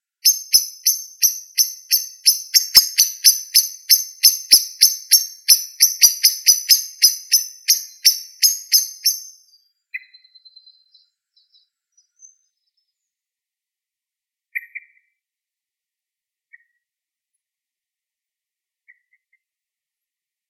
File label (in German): Lichtung Soundaufnahme Botanischer Garten Marburg